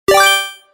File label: food eaten